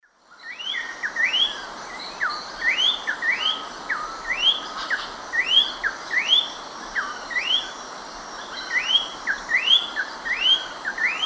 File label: Icterus croconotus, song; S. America; Eastern Lowlands